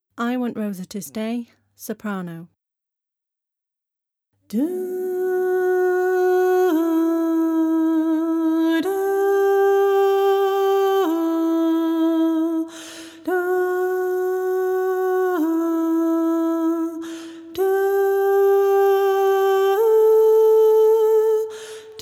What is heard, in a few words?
i want rosa to stay soprano